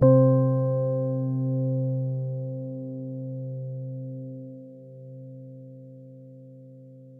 EPIANO Soft Rhodez

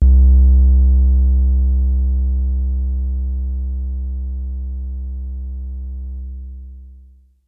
BASS Sub Low Higher

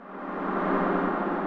Train Wreck Trumpet F2

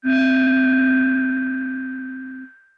Synth, Nitemare Flute

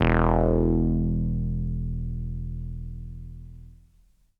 TB303 Res Verb Vinyl Synths E0, bass

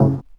Polaris Stab Vinyl Synths C#2; vinyl chords

